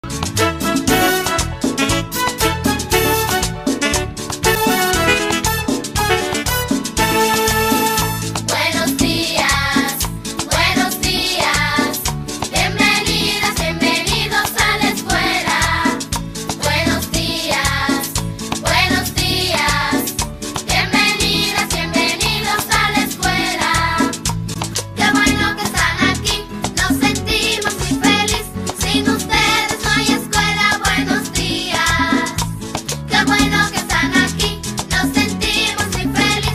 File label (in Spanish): cancion infantil bienvenidos a la escuela